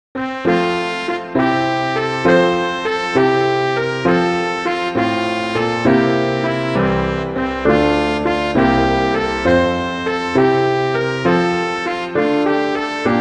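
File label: I Saw Three Ships, Christmas flute quartet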